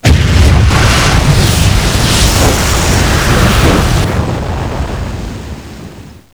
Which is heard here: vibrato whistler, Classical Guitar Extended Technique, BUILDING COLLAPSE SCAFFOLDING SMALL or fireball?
fireball